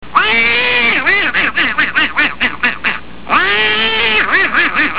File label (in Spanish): risa pato